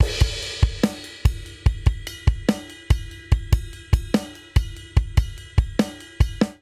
Heavy Rock Chorus 145 bpm, Drum; MIDI Clips; Ableton; Factory Packs; Drum Booth

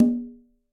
Tumba B Open, Conga, Latin Percussion; Drums; Drum Hits; Ableton; Factory Packs

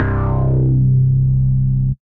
Bass

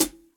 Hihat Foot; Drum Hits; Standard Hits; Cop Style; Ableton; Factory Packs; Session Drums Studio

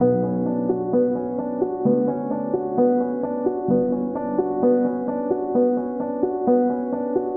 Epic Piano Arp - Bmin 130 bpm; MIDI Clips; Tonal; Motifs; Ableton; Factory Packs; Mood Reel

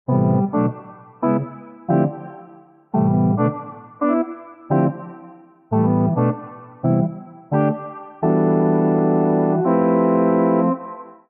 EP Chords D Minor 85 bpm; MIDI Clips; Accompaniment; Pan Party; Ableton; Chop and Swing

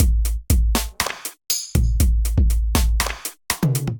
Kit, Drums Hybrid; Get Fresh Kit; Ableton; Factory Packs; Drum Essentials

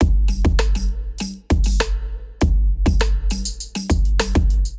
709 Kit groove 100bpm, Drum Loops; Ableton; Singularities

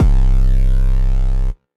Dune Buggy Sub, Bass; Ableton; Skitter and Step

